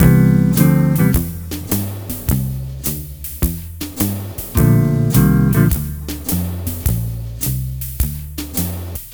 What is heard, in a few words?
Multi Guitar Swing Mix-C, 105; Zero-G Kocktail Kollection; Construction Kitz (Lounge)